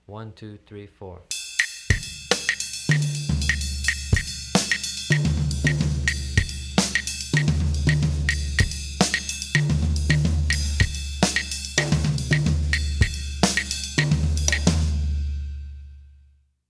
mozambique variations

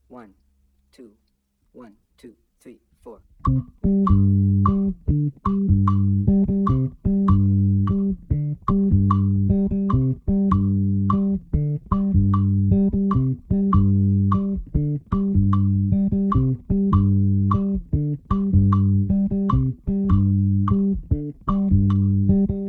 bass mambo and son montuno guaracha 3 2 clave

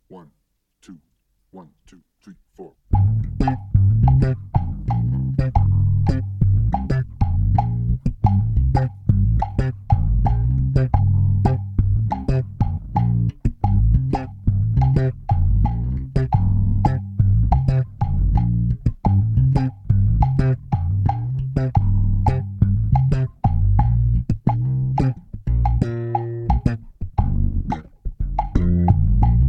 bass guaguanco funk variations 3-2 clave

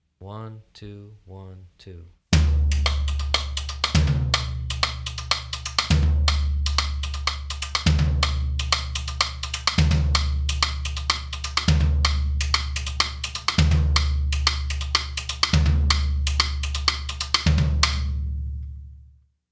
merengue 1st section

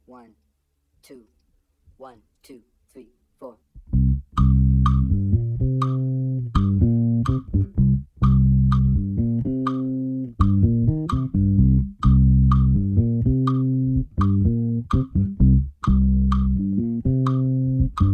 bass cha cha cha; another variation

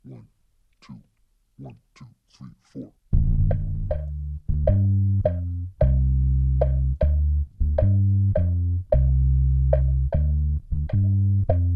bass songo

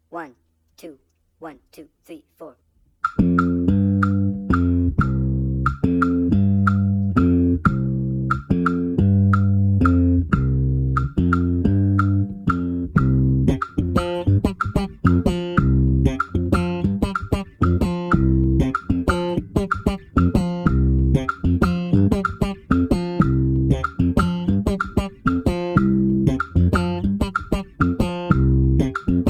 bass songo

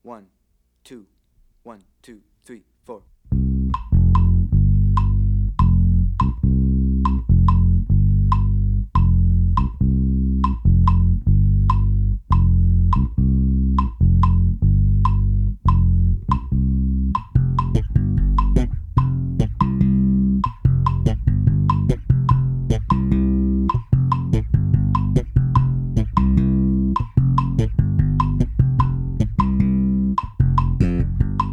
bass cha cha cha basic plus funk variations

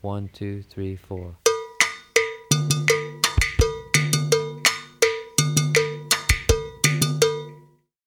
bongo bell pattern

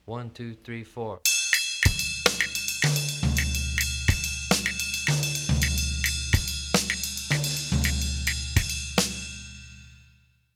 mozambique basic

